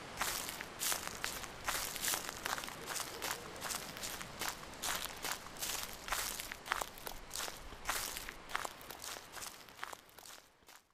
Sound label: walk woods; svencoop; lostmaze